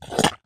mud

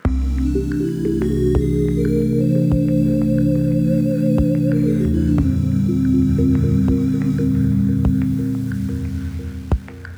Whole Tone Scale; Solos; BB 2HTC Samples Vol 4 Addendum

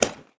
shot; bang sfx

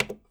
Button - Push, keyboard sounds

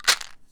Vitamin B - Shake, pill bottles

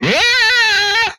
Death, Vocal

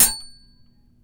Silverware Ting; BB Retail Therapy Sample Pack

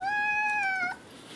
Cat Meows - Food Time; Micro Pack

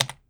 Keyboard Key; Keyboard Sounds

